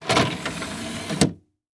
Technology Electronic Machine Wood CD Player Ejecting CD